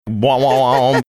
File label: making spring like sound